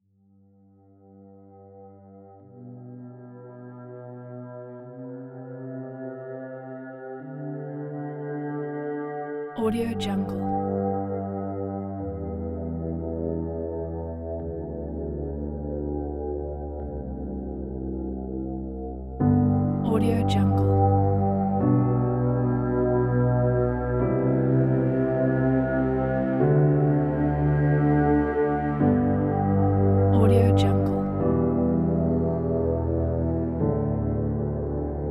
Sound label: piano soundscape kit